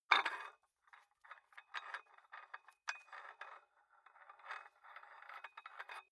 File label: dumbbell open nut thread, fitnessstudio